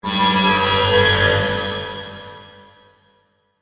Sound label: Chopper Fast Reverb, Production, Element, Chopper, Fast, Reverb; Choppers